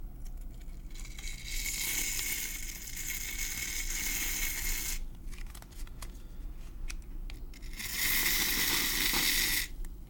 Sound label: Shaving Cream Foam